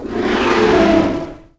Steg roar, Dino

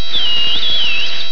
orcas; World Racing 2

